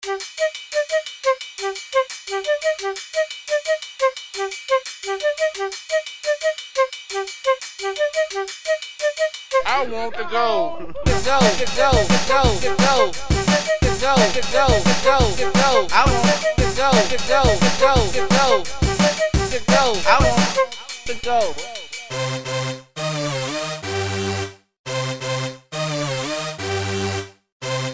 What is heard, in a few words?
Techno Remix